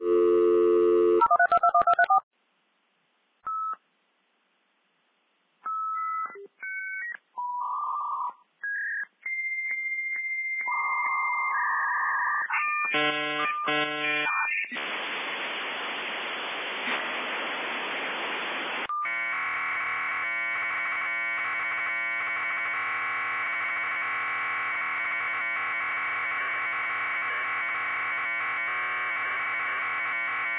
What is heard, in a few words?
modem handshake